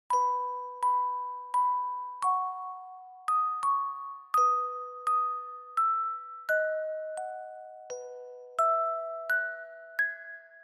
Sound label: Reverb Slow; Furusato